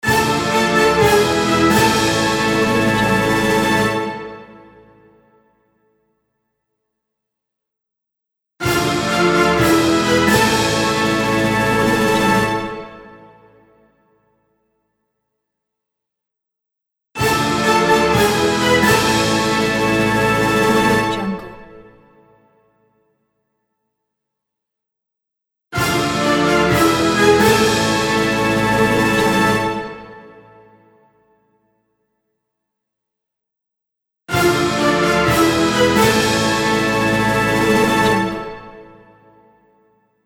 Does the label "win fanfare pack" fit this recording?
Yes